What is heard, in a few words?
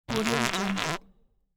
cartoon fart curious excited